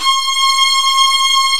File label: MIDI, STRING ENSMB; Greytsounds VOL-1